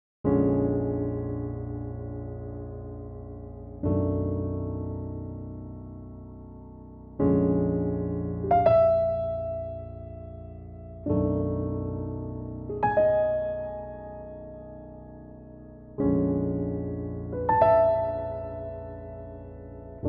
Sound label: piano; Pianotrak; key - Bb - vocal range - F to A; Beautifully dark and moody take on the classic torch song